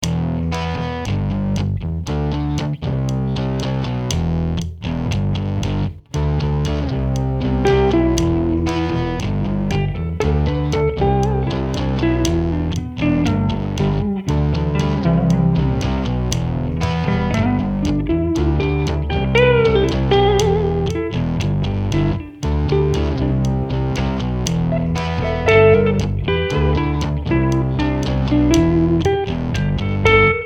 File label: improvisation, lead guitar; lead guitar in 30 minutes